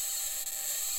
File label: mic, mars2020 edlcam